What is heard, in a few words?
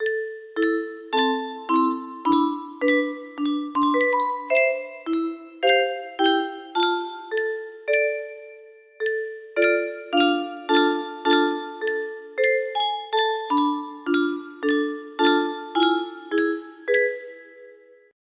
music box; expedition 33